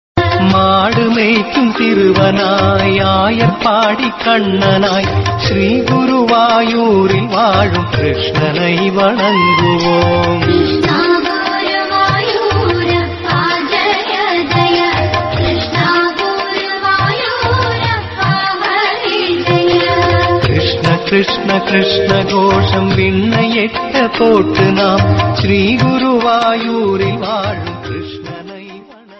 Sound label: tamil song; petral than pillaiya